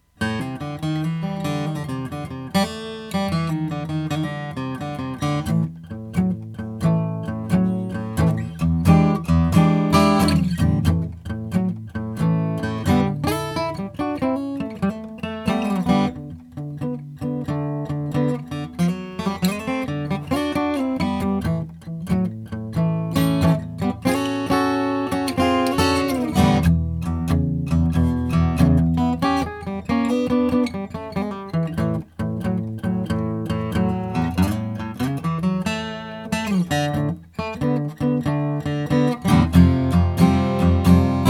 schwartz oracle brazilian rosewood

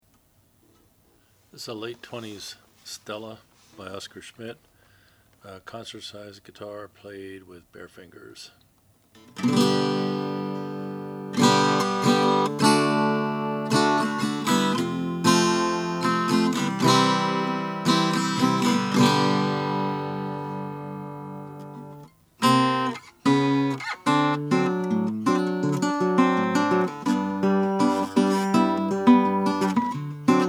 oscar schmidt stella brown burst

